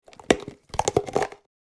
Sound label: Plastic food container open